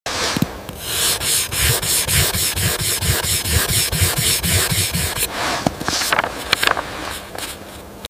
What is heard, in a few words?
Sharpening blade